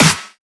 SnareClap; FLPRO

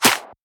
Shifted Clap; FLPRO

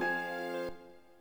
Keys N Stringz

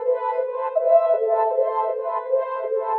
Wah Wah Syn

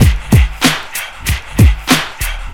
Loop

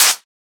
Open Hat; FLPRO

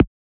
Transient; FLPRO

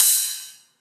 Ride; FLPRO

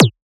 Perc, Percussion; FLPRO

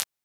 Transient; FLPRO

